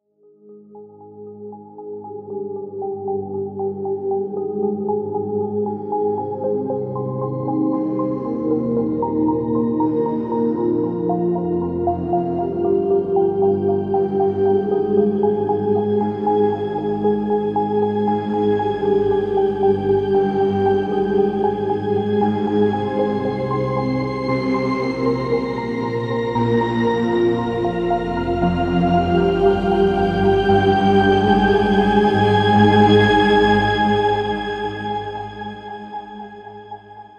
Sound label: Witch House Beat